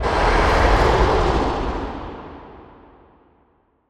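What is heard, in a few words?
Distant Abstract Scream Short, monster sounds; The Decline Of Warriors; Monster Sounds Volume II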